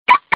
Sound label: Sms Car Alarm